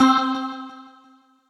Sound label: Little Keys, Retro Synths; Ableton; P-50 Linear; P-50 Structures